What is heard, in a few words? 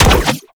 Ink Rifle Reverse Ink End; Warframe; Tenno